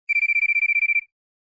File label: Cricket Beep; LG KU990